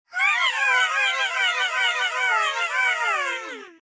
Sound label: funny cute chipmunk message notification sound effect